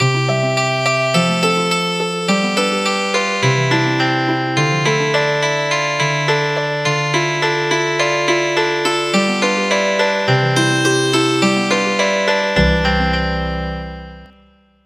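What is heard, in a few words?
Healing Harp; Row row to the fishing spot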